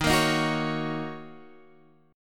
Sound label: D sharp Minor 7th D sharp, down, Guitar, Standard; D#m7 strummed